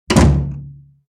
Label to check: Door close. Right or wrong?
Right